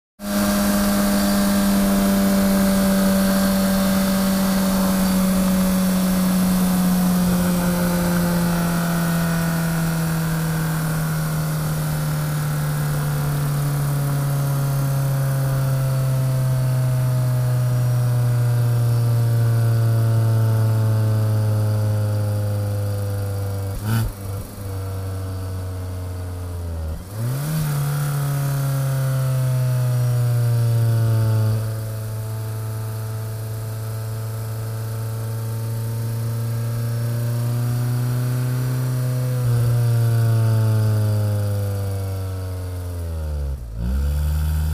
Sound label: 4 Cyl Sports Car Decel